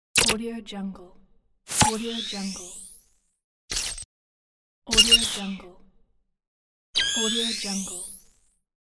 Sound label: night vision optics on off pack; medartimus